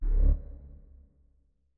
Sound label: flute notes; Hideout Furniture